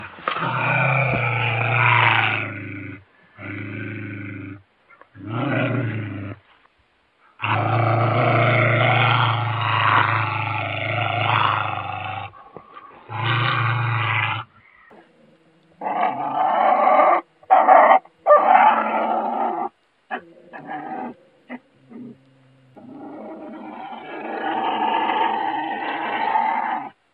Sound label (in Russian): kunica